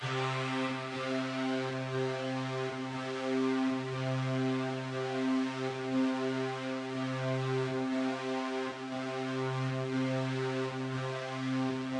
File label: Hoverboard Loop; Tricky; subway surfers city sound effects; Android, iOS